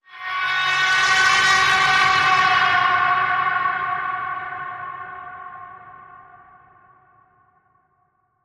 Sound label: Bend, F sharp